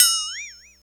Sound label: long tail reverb ride cymbal audio f sharp key